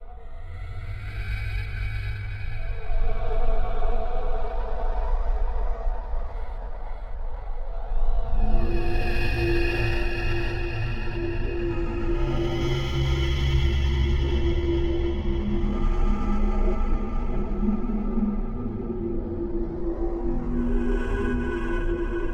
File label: glow atmospheric background